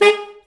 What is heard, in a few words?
SAX A. STAB, Alto Section; Roland L-CD702